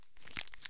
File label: slippers draw; Escape from Tarkov